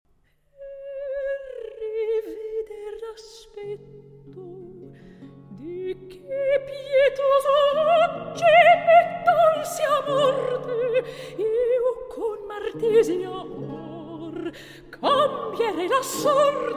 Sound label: Recitativo; Ippolita